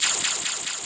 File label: sticks; Optical Media International; Sonic Images Library; SI FX Vol 9